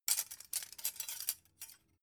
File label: dough hook handle short